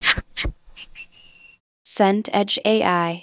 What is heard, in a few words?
dog whine